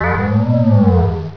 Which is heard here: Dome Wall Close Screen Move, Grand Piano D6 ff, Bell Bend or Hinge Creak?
Bell Bend